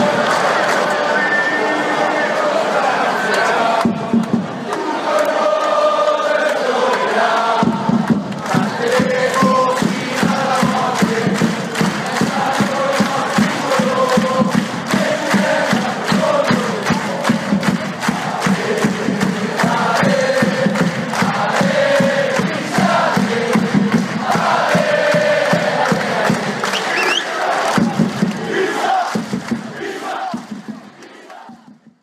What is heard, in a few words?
best pisa chants